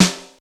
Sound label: HOLZSNARE, snare drum; SNAREDRUMS